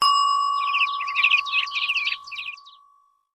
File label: Clock bell; LG A230